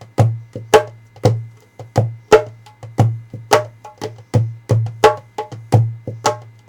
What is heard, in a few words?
djembe